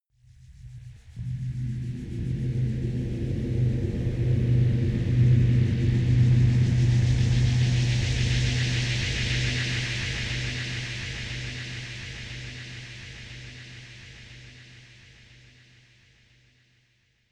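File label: enemies fleet, space; atmosphaeren umwelt; weltraum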